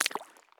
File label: walk puddle; Escape from Tarkov